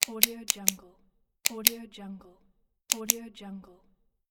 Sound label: pen clicking